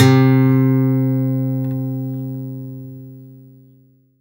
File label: HRD PICK; Quantum Leap; Guitar & Bass